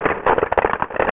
gohma alert; zelda

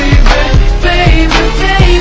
egon windup; svencoop; cracklife; weapons

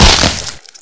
pwrench hit bod, weapons; svencoop; legends

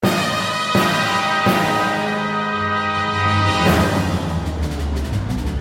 boss intro, music; zelda

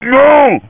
pain; svencoop; uboa; ichyhakase

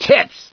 bust glass, debris; svencoop; cracklife

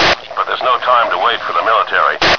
tv military; svencoop; echoes; agamemnon

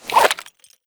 draw, wpn; svencoop; arctic incident; ins2

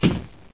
pl metal; mistake coop; player

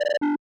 item respawn; svencoop; frostline

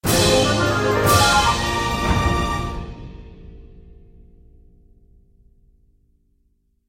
heart container; zelda; music

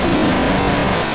flame run, trooper; sc toysoldiers

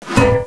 pwrench big hit, weapons; svencoop; legends

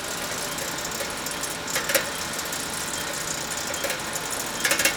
conveyor short, factory sounds; svencoop; mustard

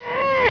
he pain, houndeye; svencoop; cracklife

